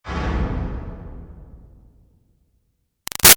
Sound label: Boom Bodyfall Car Reverb; Low Impacts; Big Room Sound